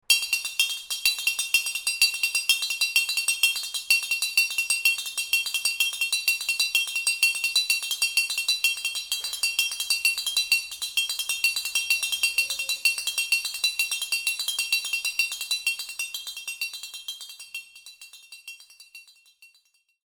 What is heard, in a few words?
Frigideira